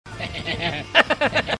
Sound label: heh heh heh